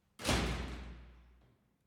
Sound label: Door slam